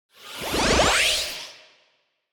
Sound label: Anime riser accent